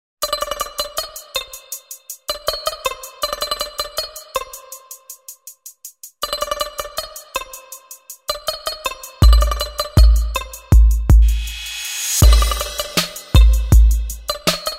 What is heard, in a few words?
Rhyme Over This; Soundzabound Volume 5